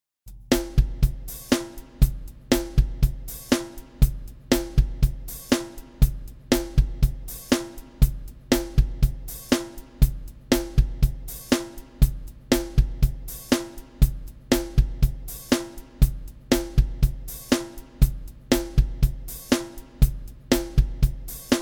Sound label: Beat